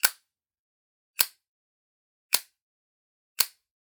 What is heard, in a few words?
Generic Click Metal Small; boom library; The Interface